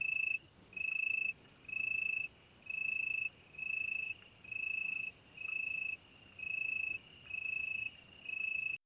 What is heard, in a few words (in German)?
Weinhähnchen